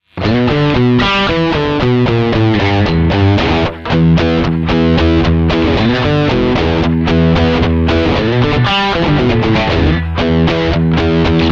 AD999 Bypass; MAXON AD999

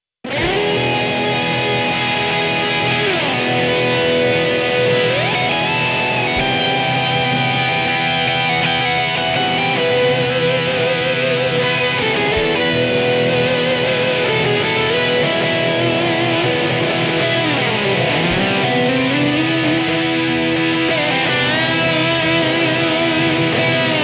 MAXON OD9PRO+ Fat Drive

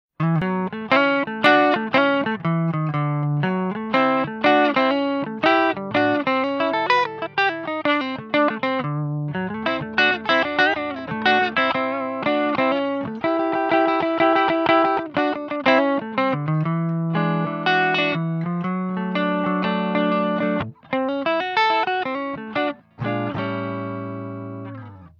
Ambience Delay Normal; Maxon AD9

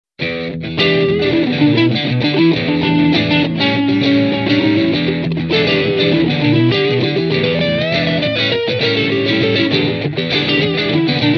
Maxon ST9Pro+ effect

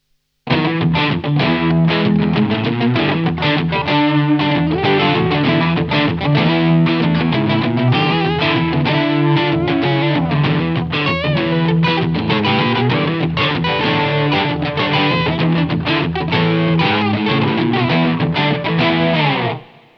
Maxon TOD9

